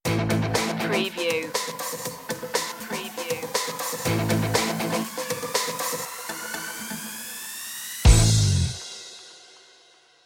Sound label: big machines stinger